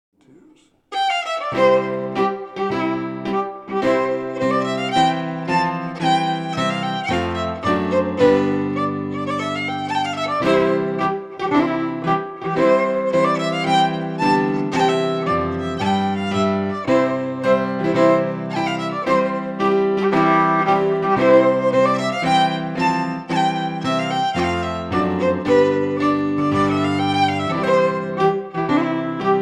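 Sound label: March, Scottish Fiddling; Morrison 1797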